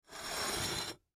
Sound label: Plate Turn Slide, Plate Turn Slide Wood Table Dish; Dishware; Household